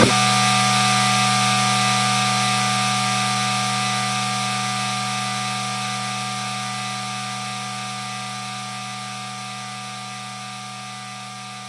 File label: Dissonant Weirdness C2